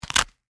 Velcro Open Pouch, Industry, Velcro, Open, Leather, Pouch; Ripping; Objects